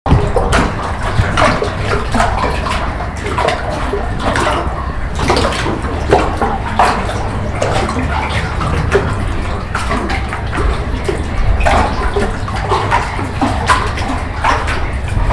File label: Water Splashes In A Tube